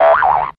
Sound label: boing